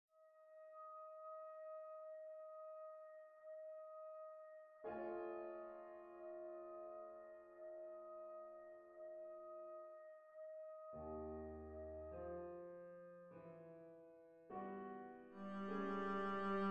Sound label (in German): sehr langsam